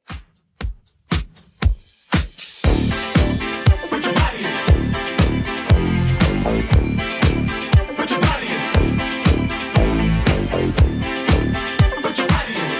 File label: 70s Redrum, 118 bpm